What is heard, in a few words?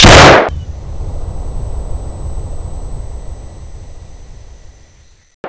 deaf sound